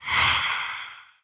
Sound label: gorilla Mask Light; gorillakingdom